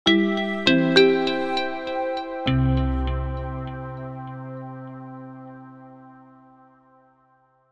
multimedia musical ident signature corporate light